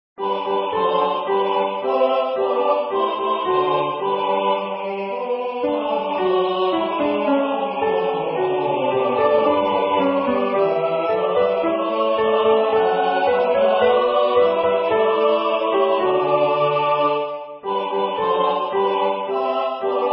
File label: Alto; Jubilate Deo